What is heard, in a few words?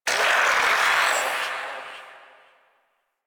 Shadow Vocal Death; Warframe; Duviri